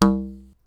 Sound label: c palm pipes